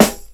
sharp snare one shot f key, snare sound tuned to the F note; snare drum samples